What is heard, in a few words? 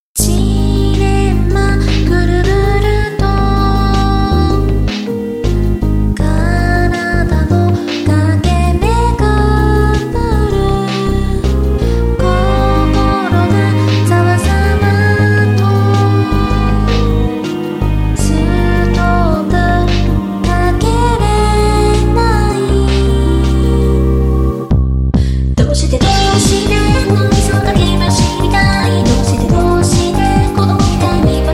pops